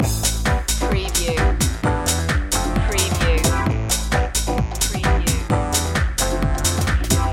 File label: beach life loop